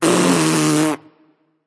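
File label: tongue, non-verbal; smite; voicepack; merlin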